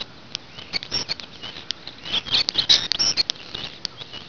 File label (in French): etourneau, chant